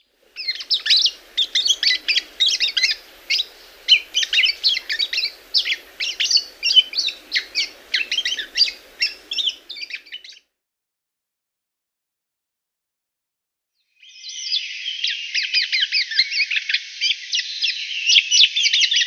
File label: Golden Oriole subsong